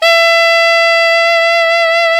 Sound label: SAX ALTO MF, Tube